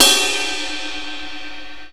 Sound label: Acoustic Ride